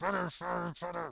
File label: boss laugh; Super Trench Attack 2